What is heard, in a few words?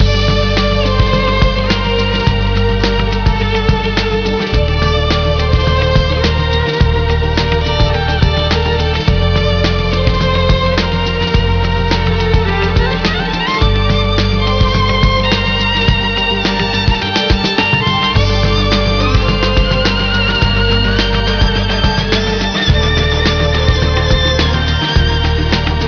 night flight; ttg server